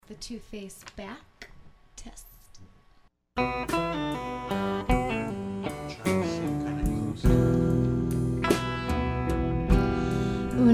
2face back; Cameo

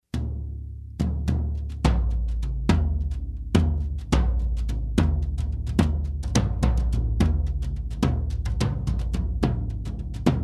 toms brushes

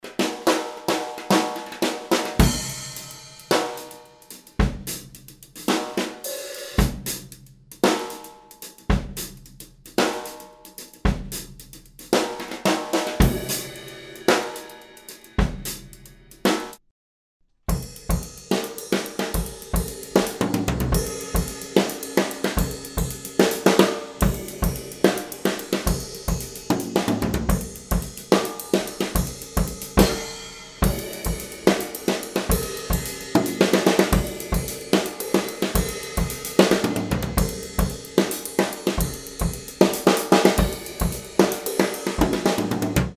drum overhead; crystal

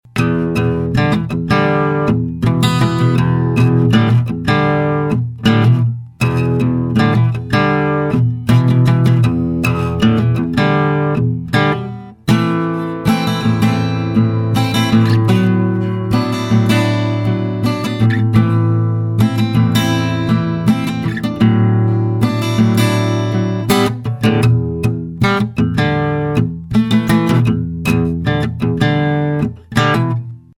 Atmosphere full sat; SilverB

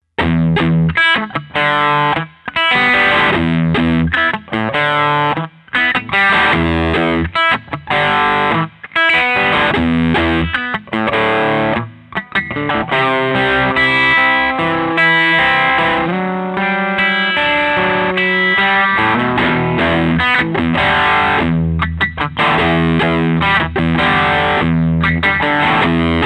front fender dist

